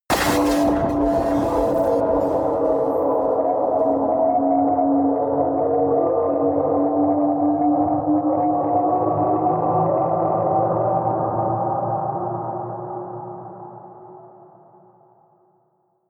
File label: stab electronic rise, drones; musikelemente